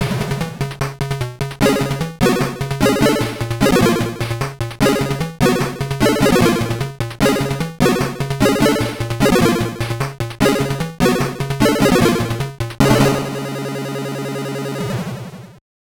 BGM; Mortal Kombat II; 1994 game gear

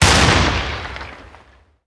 Tnt Box Explosion; Angry Birds Rio

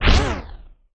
Phaserang Shoot; A Robot Named Fight

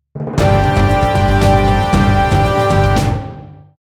Melody Battle; Phoenotopia Awakening

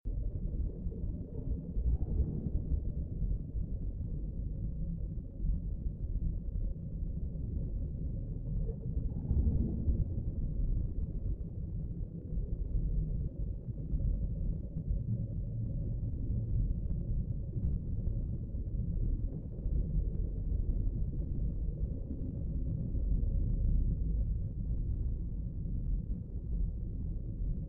Scary Ambience; five nights at freddy s fnaf sister location

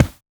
run carpet

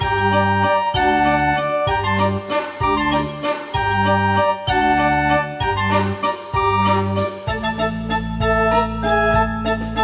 danger funny; Identity V

